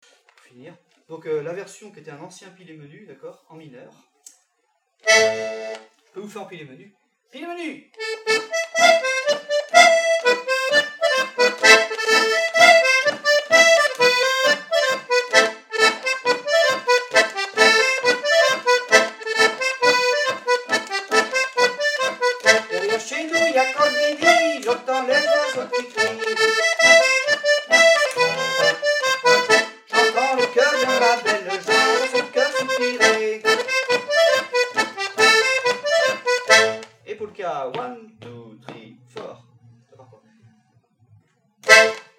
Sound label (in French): pile polka